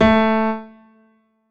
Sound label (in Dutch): piano; Gitaar